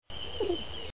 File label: coo